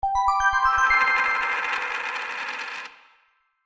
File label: Bonus Gold Coins, video game